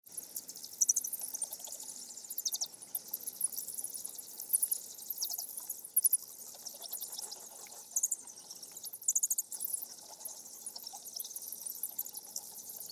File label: Mexican Free Tail Bat Chatter; bats; bat sounds; Epidemic Sound